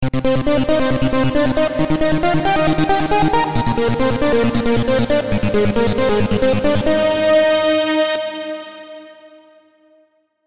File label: dance synth wet variations